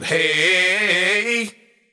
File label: voice; Loops; Phonky